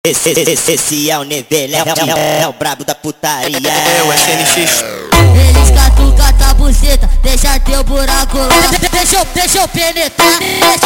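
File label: sped Up, phonk music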